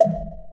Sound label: death pop; smite; chaac